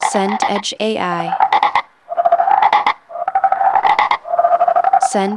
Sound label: frogs pond night